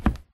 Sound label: wood step; Golden Crayon Sounds